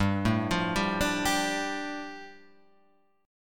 A 7th Suspended 4th Sharp 5th, G

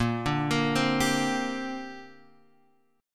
D 7th Suspended 4th Sharp 5th, chord

